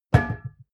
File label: glass door small close